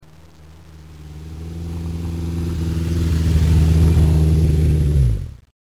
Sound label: motorboat